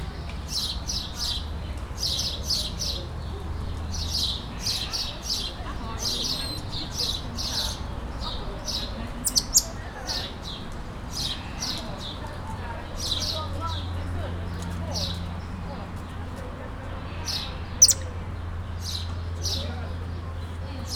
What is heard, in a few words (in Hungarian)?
háromszor hangosan, lisztmajmocska gyapjasfejű tamarin; kecskemeti zoo 2016